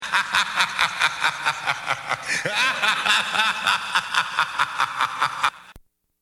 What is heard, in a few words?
MAD LAUGH